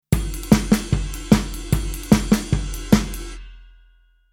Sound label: Rhythm Rock n Roll